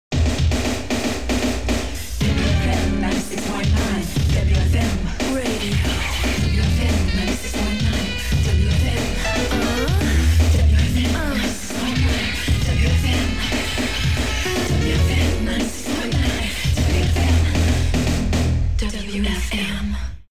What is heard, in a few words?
Jingle; 96.9